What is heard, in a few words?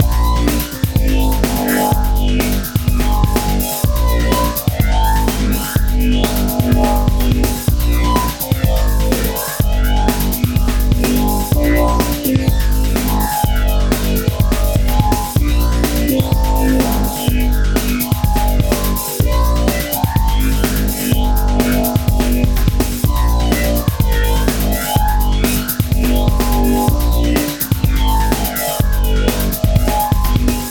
Loop